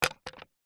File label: sport cricket bat set down concrete; zapsplat